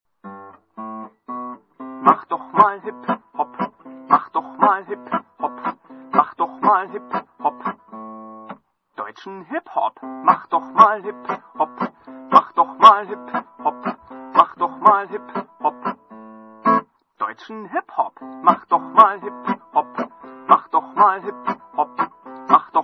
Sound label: hip hop